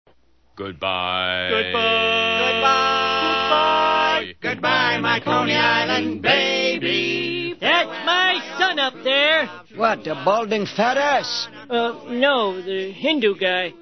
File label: quartet